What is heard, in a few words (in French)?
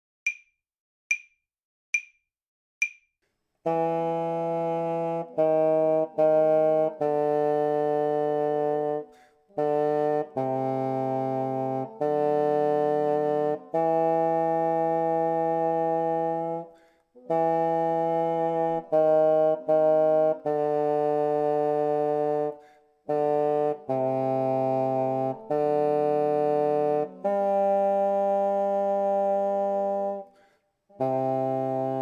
poeme 3 bassons, basson; poeme trio